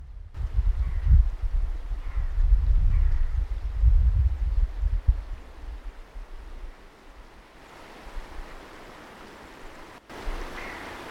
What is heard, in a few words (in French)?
Mongolie Arkhangai près de la rivière